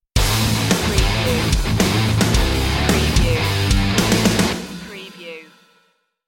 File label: drop the hammer stinger